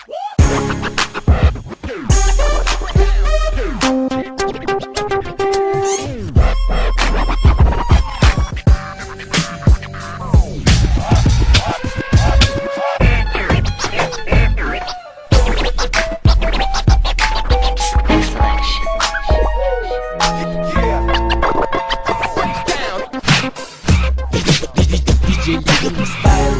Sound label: best dj scratch mix